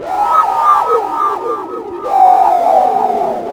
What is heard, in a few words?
SPACE FX; Big Beat Samples & More; AKAI; OTHERFX KIT